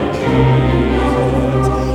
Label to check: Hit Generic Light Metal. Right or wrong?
Wrong